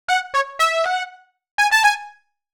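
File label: trumpet solo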